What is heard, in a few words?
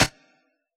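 snare drum Elect., Yamaha DJX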